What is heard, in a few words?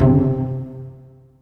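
cello pizzicato C2, Virtuoso Strings; ILIO; Kirk Hunter Virtuoso Strings VOL-3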